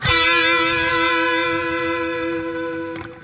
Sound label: Funk Guitar; 90 s Sample CDs; Zero G